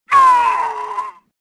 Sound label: death; Star Wars Jedi Knight II Jedi Outcast; XBOX; shadow